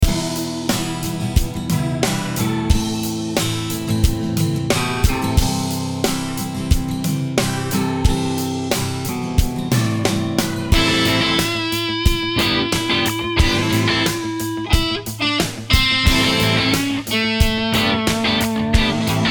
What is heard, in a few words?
Acoustic Rock 80BPM to 100BPM; Acoustic Rock Demo